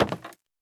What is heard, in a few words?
wood, footsteps; civ14